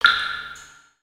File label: Drip; ninjago